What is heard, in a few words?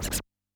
SCRATCH, Yamaha DD-65